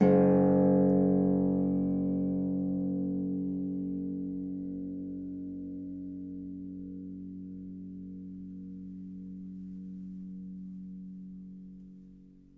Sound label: Harp G1; Miroslav Vitous - Percussion & Harp